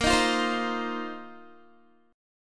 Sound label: Bb Suspended 2nd Suspended 4th, Guitar; Bbsus2sus4 strummed